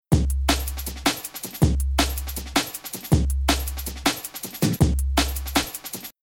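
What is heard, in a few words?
Rhythm DnB Loop pattern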